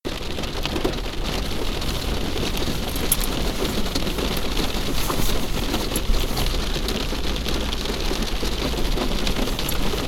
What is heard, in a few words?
Rain on Car Roof, binaural